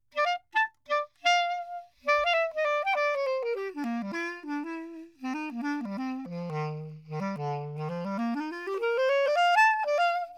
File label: CLARINET